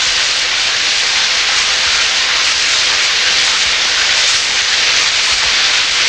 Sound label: SHOWER, HAUSHALT